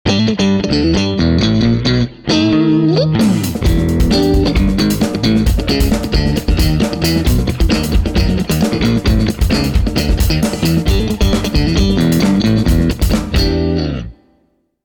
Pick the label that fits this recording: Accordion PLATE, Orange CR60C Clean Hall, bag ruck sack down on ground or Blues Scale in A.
Orange CR60C Clean Hall